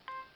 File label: motorola razr ringtones ding